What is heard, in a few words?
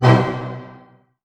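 F# major pianissimo, orchestra hits; Sound On Sound - Peter Siedleczek's Orchestra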